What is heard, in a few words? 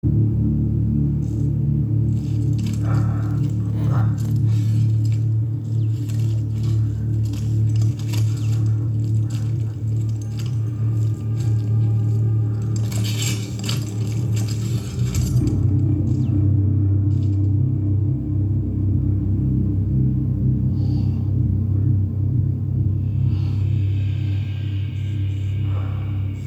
Ambs; Resurgence